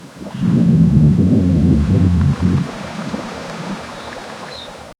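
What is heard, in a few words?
low ominous thunder rumble; croak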